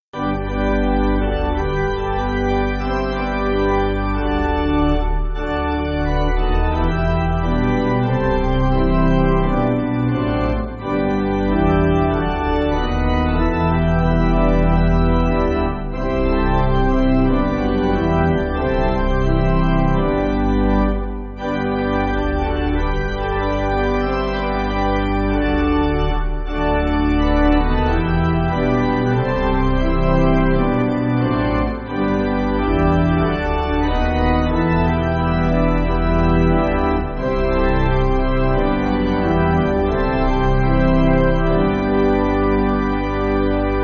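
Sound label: Pipe, Pipe Organ, Chinese Melody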